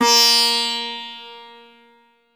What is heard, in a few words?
sitar